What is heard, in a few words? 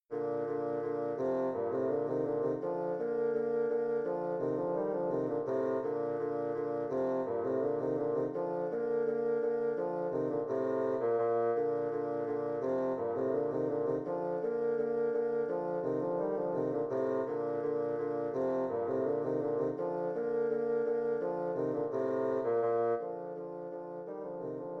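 rondeau bassoon duet; chedeville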